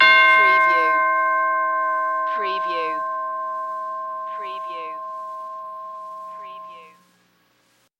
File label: Tubular Bell Single